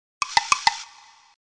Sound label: Collect Clockwork Egg; Kazooie; Banjo-Tooie